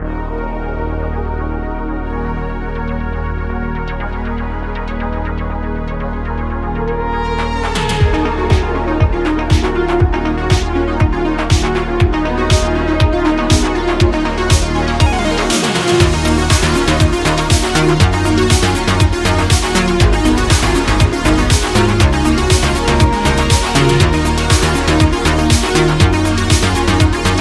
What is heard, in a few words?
Retrowave, synthwave